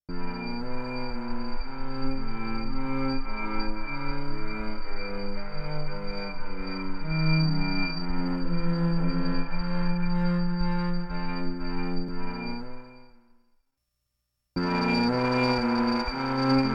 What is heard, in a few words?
stereo fuzz viola